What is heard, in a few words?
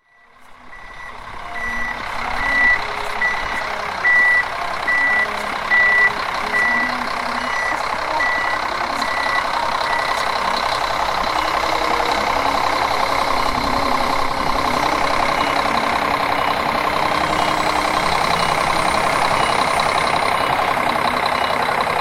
tractor snow